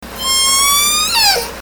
call S1

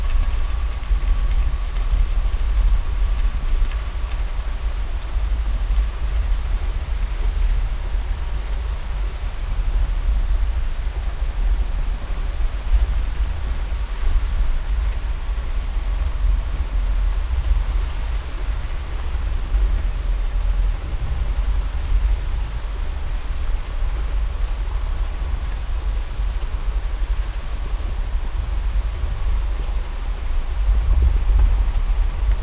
Heaving To, ships

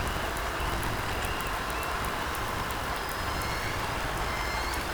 click test

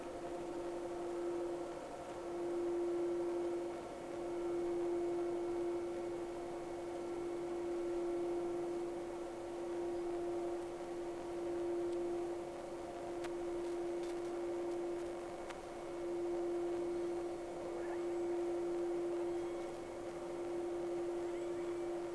Whales; SeaSnake

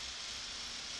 Background Noise

